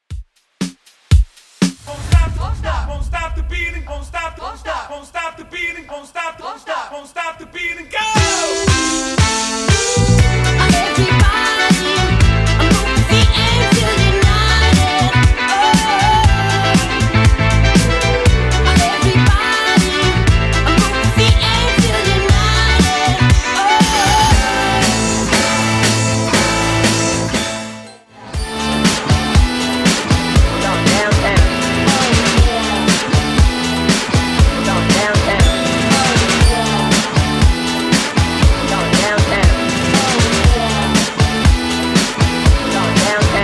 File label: 2ks Redrum 119bpm